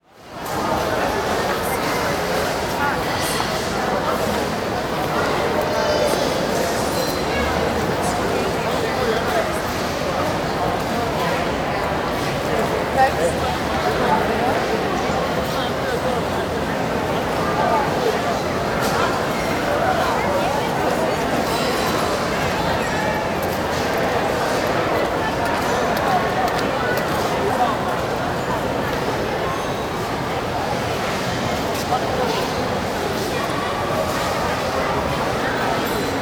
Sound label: Station Large Train, Walla; Sound Ideas; Walla Series